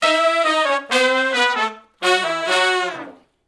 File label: R&B Riff (F#) unison, Horncraft